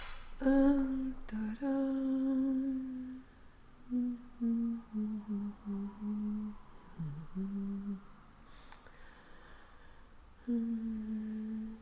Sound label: tango